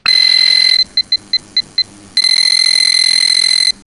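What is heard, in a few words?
electronic beep suspenseful; financial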